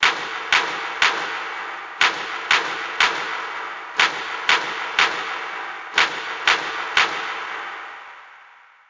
Clap; MLB Power Pros 2008

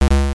buzzer

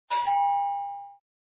Door bell

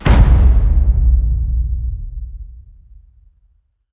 Camera Hits; Plants vs. Zombies Battle for Neighborville

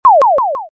Jellyfish shoot; Robot Wants Fishy

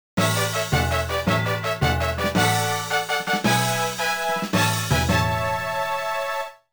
Level-Clear Fanfare; Picross 3D; NDS

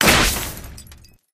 Evil Spawner Damage; Candy Crush Saga

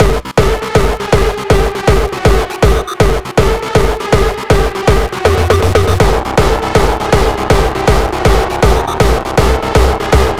Beats Loop; Anger Foot

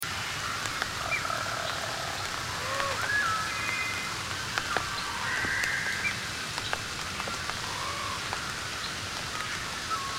Yavin Rain; Yavin IV

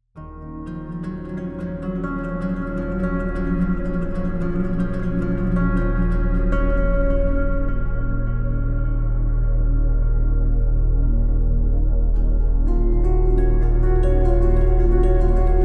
Training Ambient; Ready or Not; Supporter OST

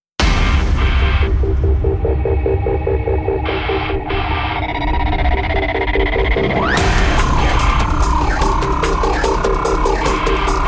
snow modern heavy; Snow Moto Racing Freedom; PS4

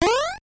Jump; nuts milk; nes

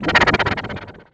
sfx Hypnotize; lego ninjago rise of the snakes

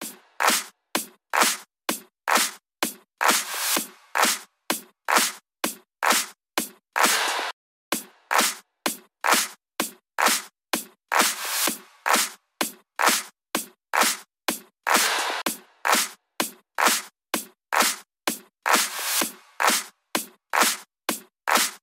Minimal techno

